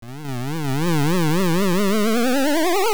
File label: Dizzy; Second form dizzy after getting hit; NightmareShadow2